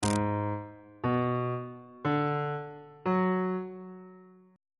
f sharp minor 7th chord 2 is g sharp half diminished 7th on bass clef